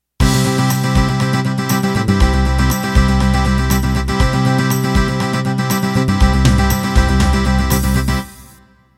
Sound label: Country 16 Beat Intro